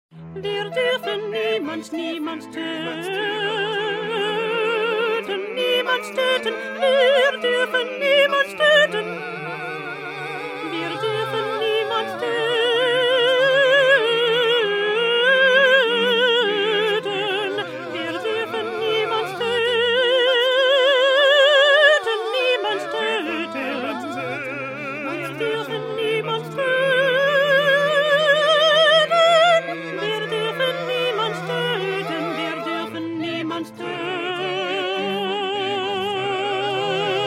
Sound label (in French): PETIT CHOEUR ALTI